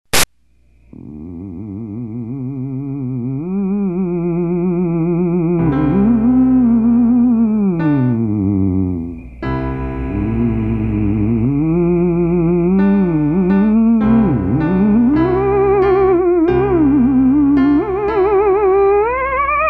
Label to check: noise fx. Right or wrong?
Wrong